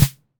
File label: Snare